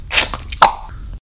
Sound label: unlock